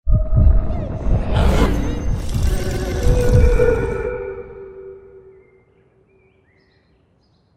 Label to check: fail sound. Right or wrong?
Right